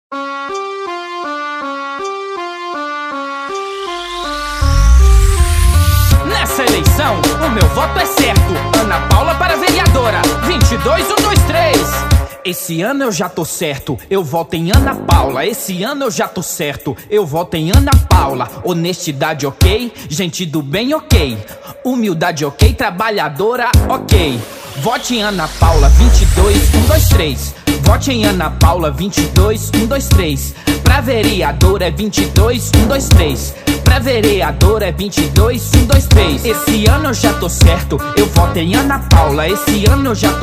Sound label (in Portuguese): jingles politicos funk